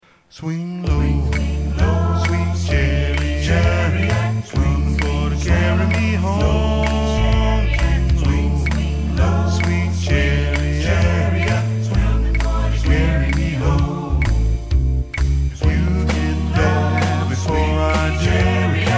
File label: swing low